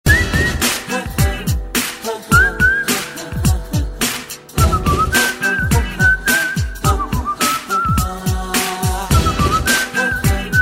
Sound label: huawei p9 ringtone